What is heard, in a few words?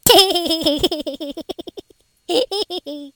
HillBilly, Laugh